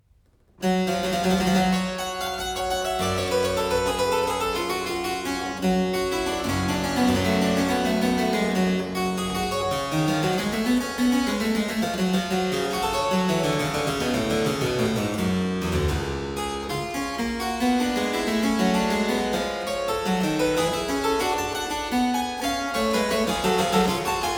Prelude in G minor, Miscellaneous pieces for the harpsichord; Complete Chamber Music; Brilliant Classics